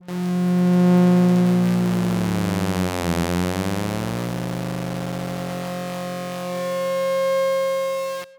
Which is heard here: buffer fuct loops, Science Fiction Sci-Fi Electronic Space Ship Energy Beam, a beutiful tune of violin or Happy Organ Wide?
Science Fiction Sci-Fi Electronic Space Ship Energy Beam